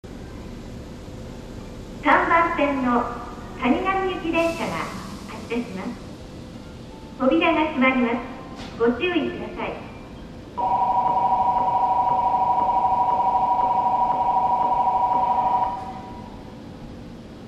bell

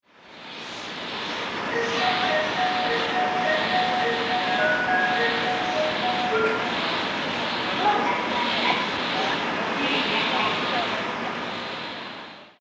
melody, station; keihintohoku; kamata; jreast

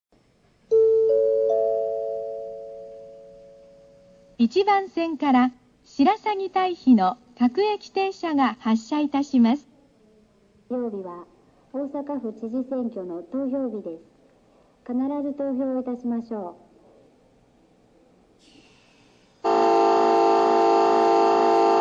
sakaihigashi bell; nankai

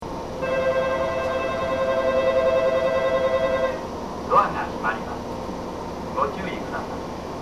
minamitama bell; jreast

